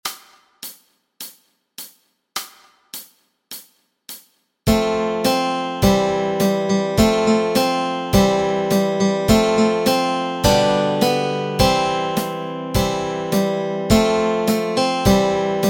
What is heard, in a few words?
Old King Cole melody and chords